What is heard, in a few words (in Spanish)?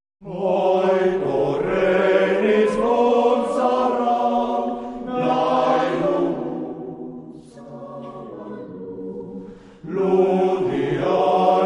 coro; coro eguzkilore; 2a Bienal Coro Mixto Pena Santa